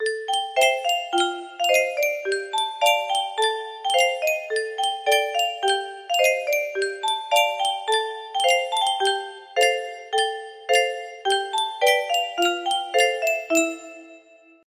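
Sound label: music box melody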